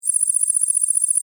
Readout Typing Data, Scifi, Entry; Science, Fiction; Computer; BlastWave

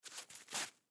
Snow Movement Small; Ice; Weather; Ice Sound Effects; Blastwave

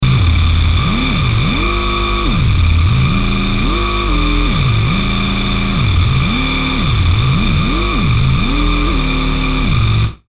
static engine; Static Engine Revs; Production Element Imaging Element Accent Transition; Blastwave; Static Sound Effects

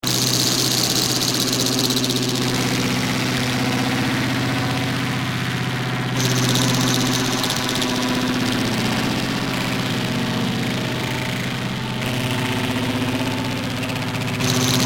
Big Industrial metallic harsh grinding sound; Grinders; Machines; Heavy Grinding

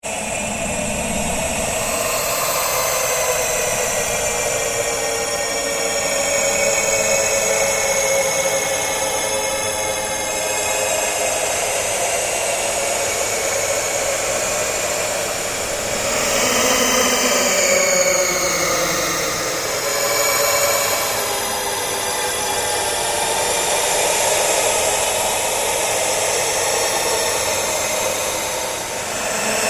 AMBIENT DRONE GHOST CHATTER SLOW; Sound effects created by manipulating other sounds; Scary Soundscape; Scary Soundscapes

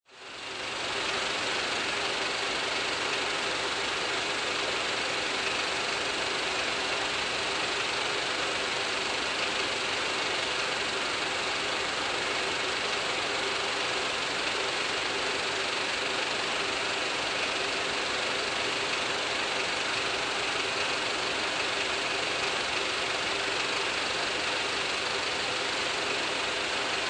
Old Film Projector Vintage Film Projector Run; Projectors; Lynne Music

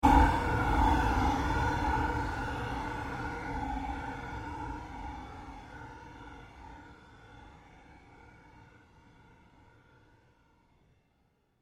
PULSE FADE LONG; sound building blocks created through manipulation of raw material; Rise And Dive

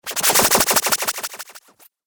Wizard Spell; Vinyl Blair; Magic Sound Effects; Andrew Fleming

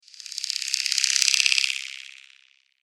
Horror Fast Leggy Bugs; Monster Sound Effects

